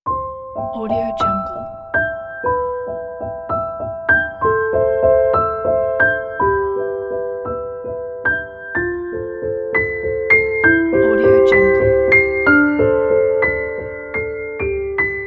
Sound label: classical; آهنگ بی کلام کلاسیک